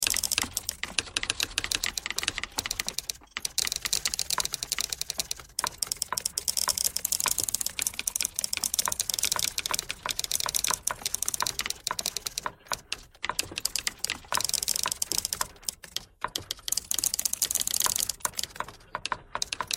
typewriter thread all

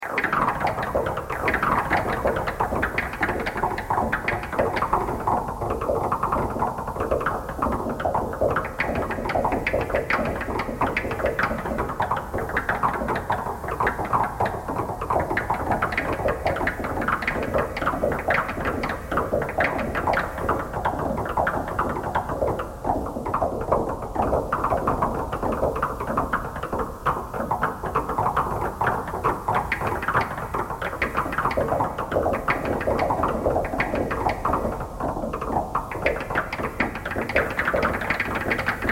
knocking thread noise all

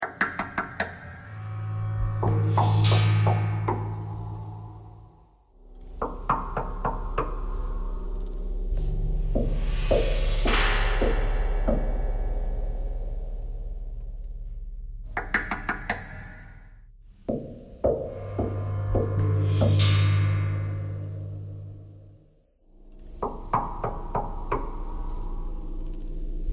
knocking thread noise

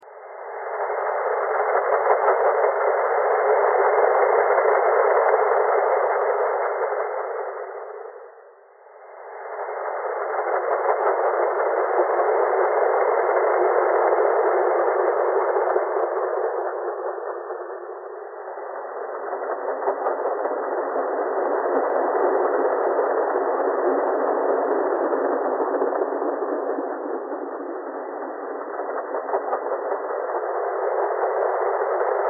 thread simplebuzz

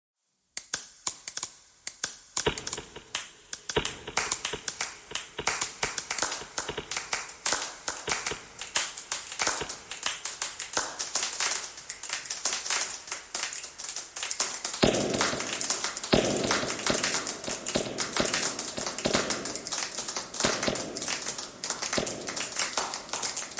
clapping thread noise

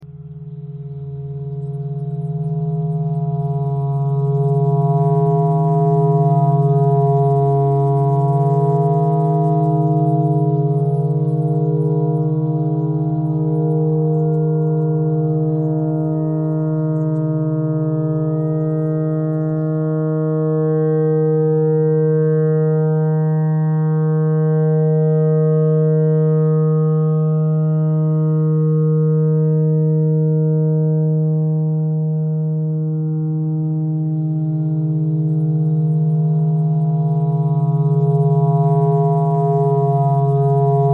clarinet notes e clarinet thread pentatonic

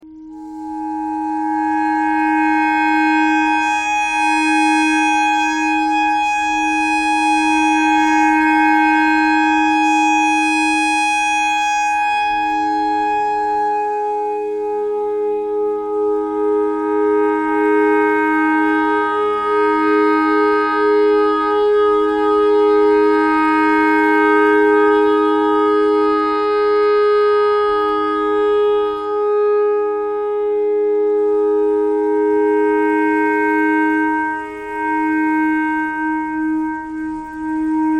clarinet notes e clarinet thread pentatonic

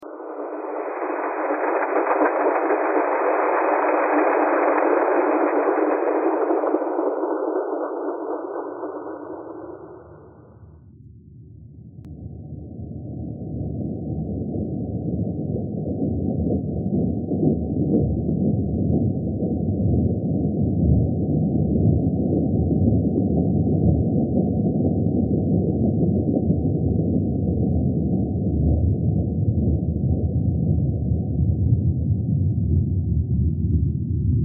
thread pentatonic

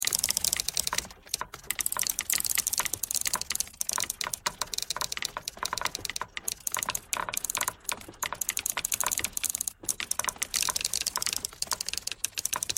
typewriter thread pentatonic all

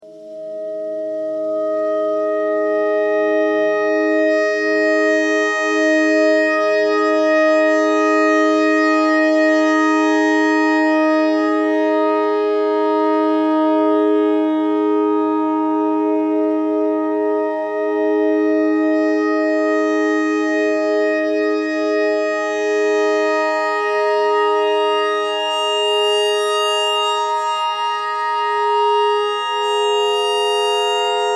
clarinet notes e

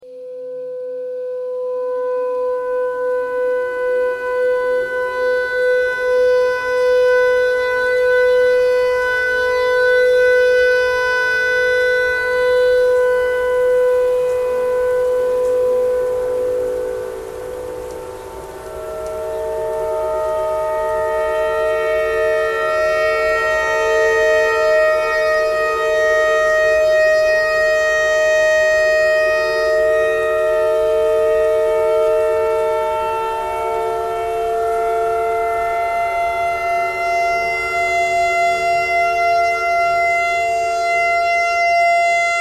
tornado siren clarinet notes e noise

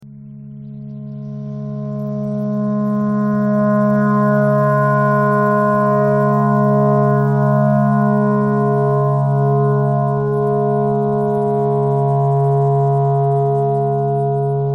clarinet clarinet simple

